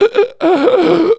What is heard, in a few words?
WELDER AFTER FIRE; Messiah; The Games Machine 76